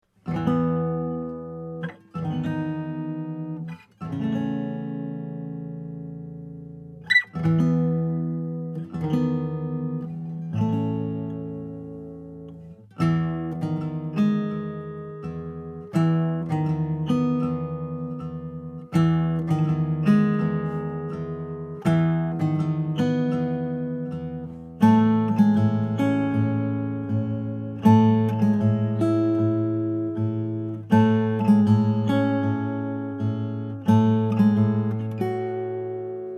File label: g r bear grand 00 cutaway figured acacia